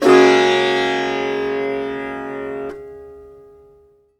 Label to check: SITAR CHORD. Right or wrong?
Right